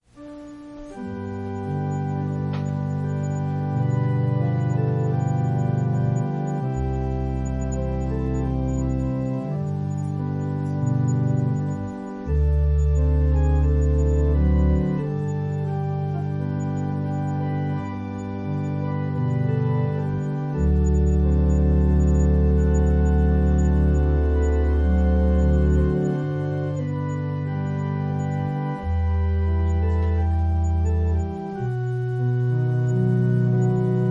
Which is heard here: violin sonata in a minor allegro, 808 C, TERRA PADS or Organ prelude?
Organ prelude